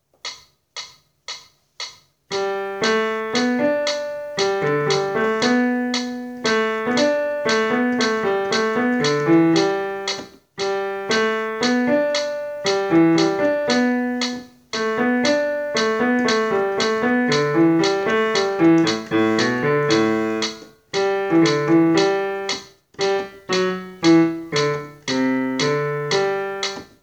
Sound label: Boating Ballad Bass; Accompaniments